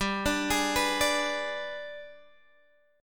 Ab Minor Major 7th Flat 5th, G

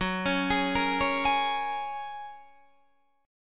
F sharp Major Add 9th, Guitar Open D

